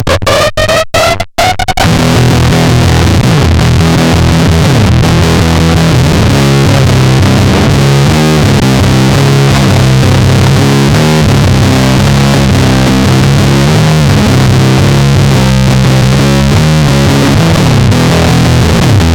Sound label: wicked riff harmonic; deathbyaudio